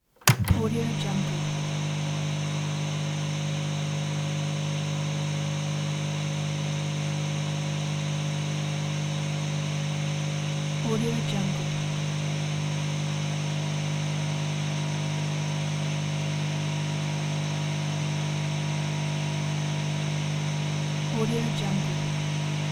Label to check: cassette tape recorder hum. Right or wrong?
Right